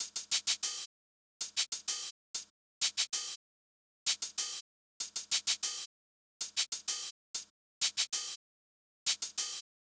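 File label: Drums, Hip Hop Exotica, 096 A minor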